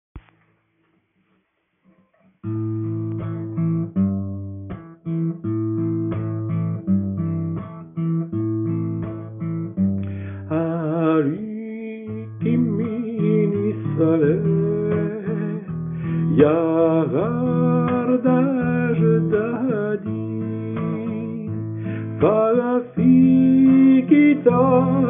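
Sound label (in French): Aliki mini assalam Partie sopranes ténors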